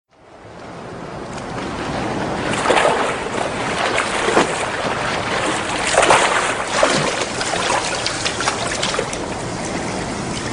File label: crawl